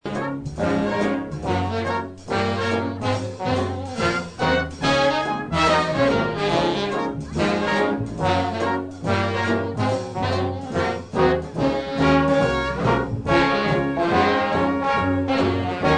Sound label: swing jug